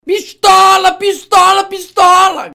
pistola

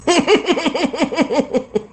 risada

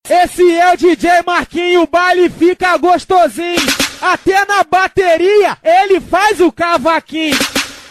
baile gostosin

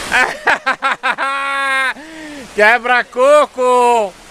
quebra coco ringtone

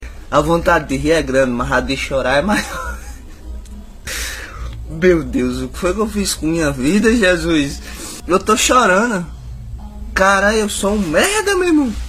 vontade de rir; ceifa

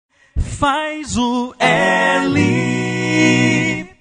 faz o l vinheta

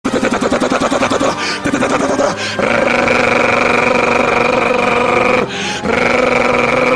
metralhadora

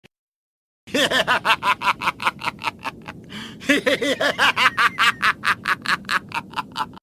risada maligna; saga gemeos

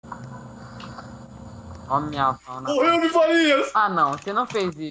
o choro

